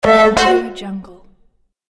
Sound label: wrong move